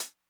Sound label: Hat Closed Mid Decay, 909